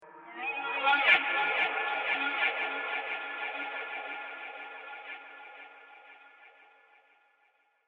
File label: Lost In Space, science fiction sound effect